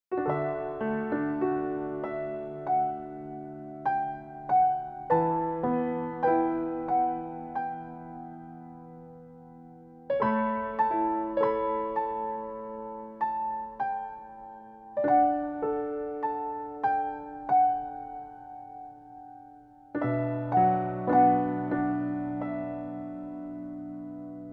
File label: Classical Piano Music